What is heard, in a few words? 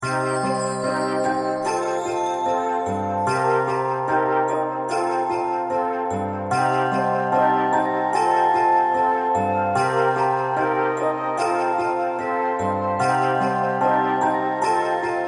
bgm zone village; zone3; goken